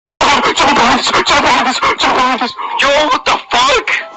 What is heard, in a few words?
awoakwoak setan setan